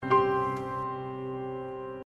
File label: sms